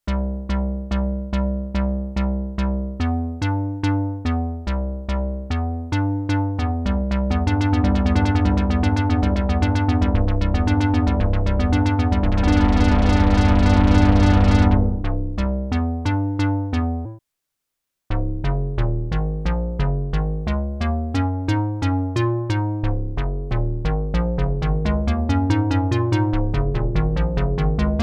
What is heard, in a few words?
arpeggiator, p600